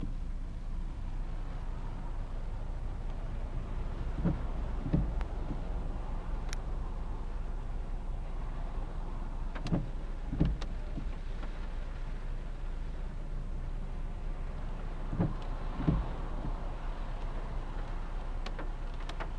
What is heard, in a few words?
rain on car roof as i sit in traffic jam